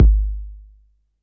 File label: BD Ana Soft Short; YAMAHA RM1X